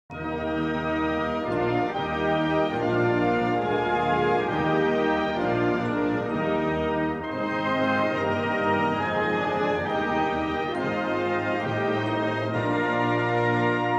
German anthem

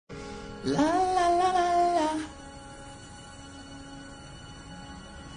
Lala melody

